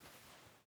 lr300 grab mag; Rust

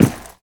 land carpet, footsteps; Call of Duty Modern Warfare

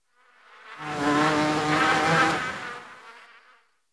insect fly; Call of Duty Modern Warfare

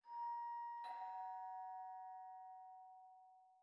ambient battle; World Of Warships

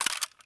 m4 check bolt out; Escape from Tarkov

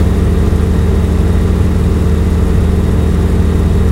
yak cockpit, engines; War Thunder

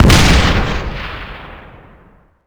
frag grenade explode near

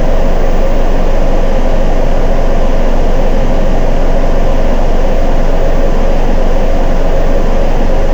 Plane Fur Wing Turbulence In; DCS

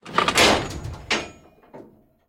gears rattle; tanks; War Thunder

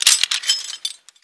rappel clipout

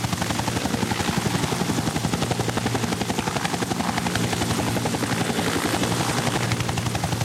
mi35m engine outside engine idle; engines; War Thunder

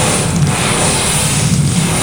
beam target loop

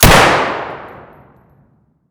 sv98 fire indoor distant; Escape from Tarkov

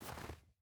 footstep boots snow walk; Rust

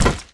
grunt iron run

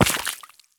sprint puddle; Escape from Tarkov

